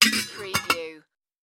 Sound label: Cookie Jar Close Lid